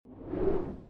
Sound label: whoosh